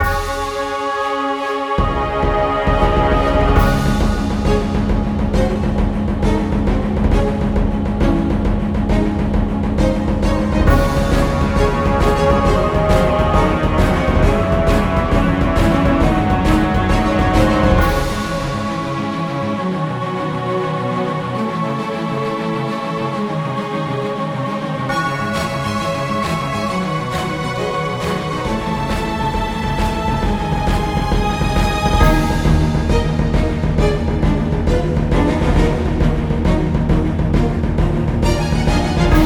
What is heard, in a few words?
Shooting; Futurecastle2 Shooting